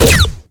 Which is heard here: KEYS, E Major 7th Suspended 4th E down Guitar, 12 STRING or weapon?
weapon